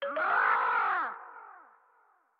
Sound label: Attack; StyxMech